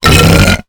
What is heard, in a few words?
Demon Belch